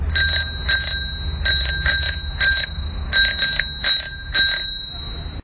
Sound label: bell